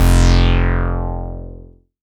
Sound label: bass F1, Moog Minimoog